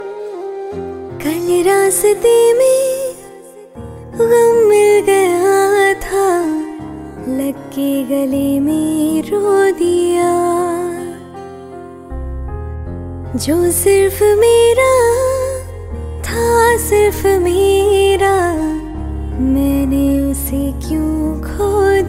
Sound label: Female Version Ringtone